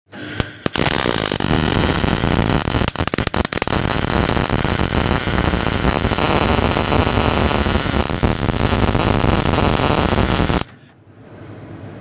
Poste a souder

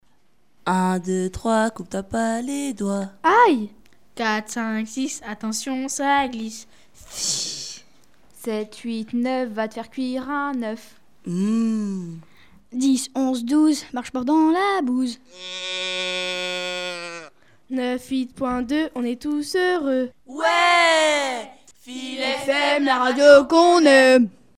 jingle un deux trois

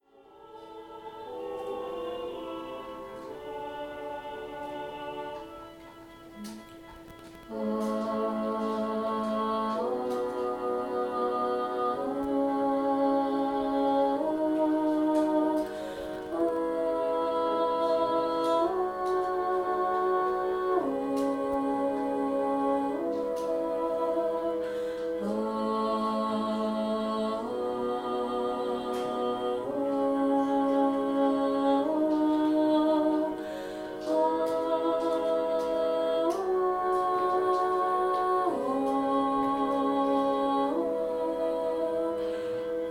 Intermede BASSE

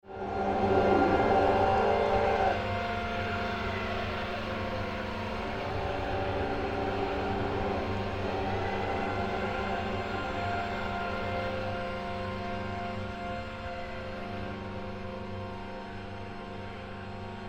sirenes

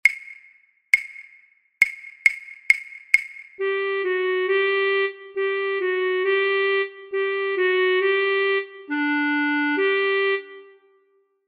Gavot acc a

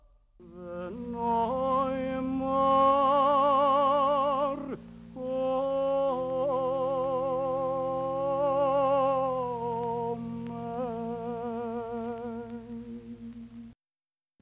Chant juif

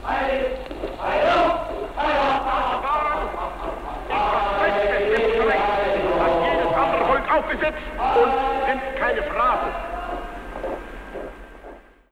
Chant armée allemande voix; Hitler

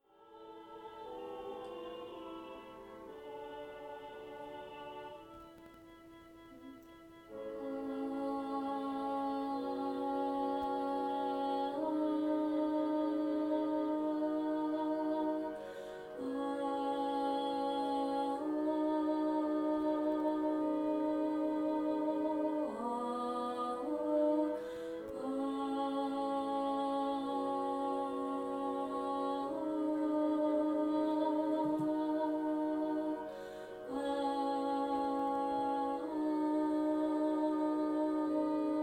Intermede ALTO

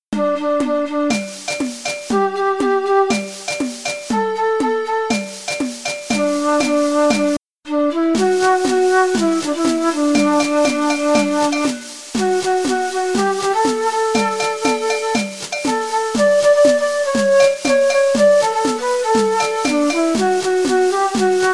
Samba cada pour Chanter seul

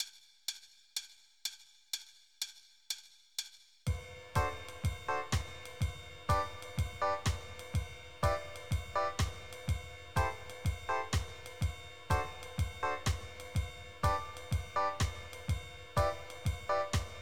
pour basse

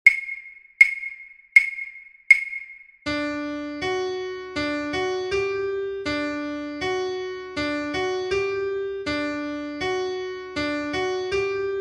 gavotte phrase b

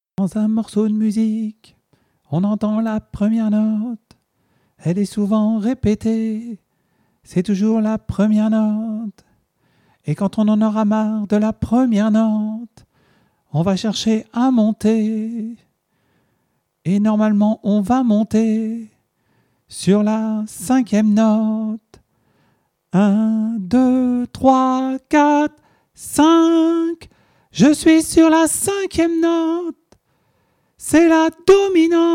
Blues La Dominante

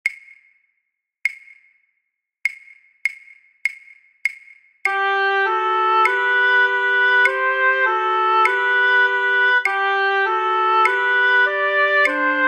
Gavot phrase a lent

